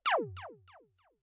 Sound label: laser; spacegame